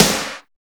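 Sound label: SMACK SNARE; Drums In Your FACE!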